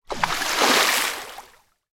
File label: water splash or movement